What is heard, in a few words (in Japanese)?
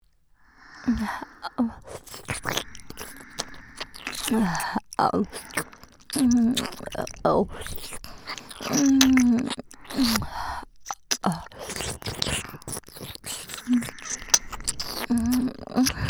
左右同時耳責め; ぐっぽり